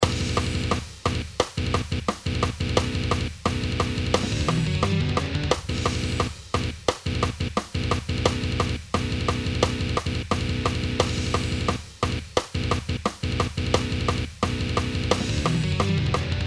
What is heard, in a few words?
metal riff breakdown